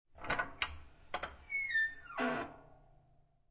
Household, Fireplace Door Open, Squeaky Steel Fire Place Door